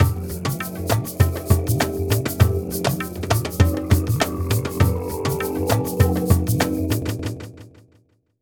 Musical accent tribal sacrifice spiritual dark rhythm drums shaker; SFXBible